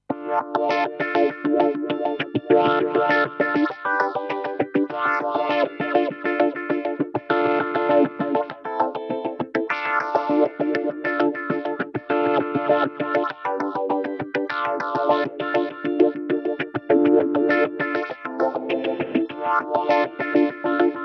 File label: Plucked Rhythm, Phaser, Auto Pan, Delay, Reverb